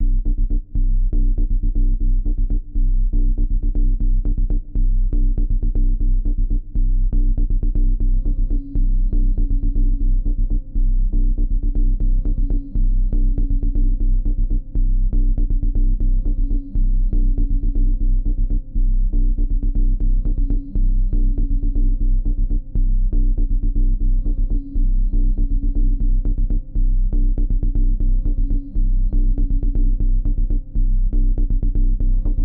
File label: Ambiant